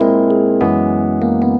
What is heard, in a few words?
CHORD, Drum & Bass, 150 BPM; Ueberschall; Drum & Bass Flash Trackz